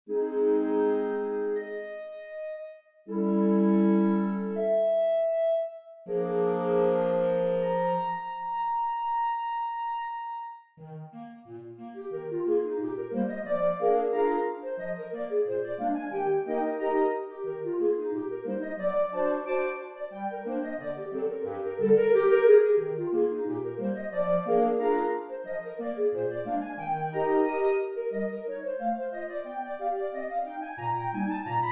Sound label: Pizzicati Clarinet; Delibes; Sylvia